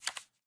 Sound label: Change Card; Game rip; MiSide